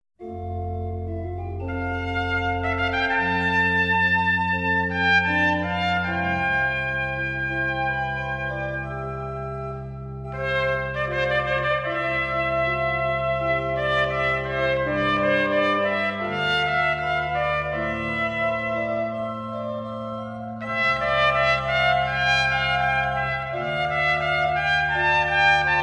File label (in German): Sonata, trompete u orgel; Giovanni Bonaventura Viviani